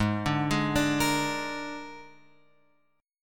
G sharp Suspended 2nd Flat 5th, guitar chord; G#sus2b5 Chord {4 5 6 7 X 6} arpeggiated

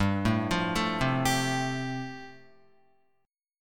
G 7th Suspended 2nd Suspended 4th, Guitar; G7sus2sus4 arpeggiated

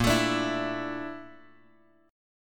Bb Major Sharp 11th, Bass Standard; strummed; JGuitar

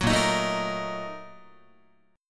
Bb Major 7th Suspended 4th Sharp 5th, Guitar; strummed; JGuitar

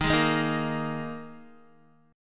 D sharp Suspended 4th, Guitar; D#sus4 strummed; JGuitar

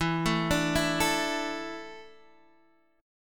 E 7th Suspended 4th, Guitar, Half Step Down; E7sus4

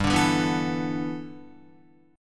G 6th Add 9th G Guitar, chord; G6add9 strummed

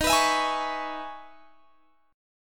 Db Minor 7th Eb

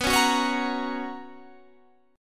B Major 7th Suspended 2nd, chord

